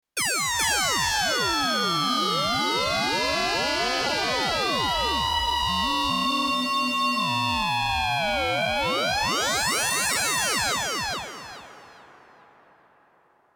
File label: FX FM modulation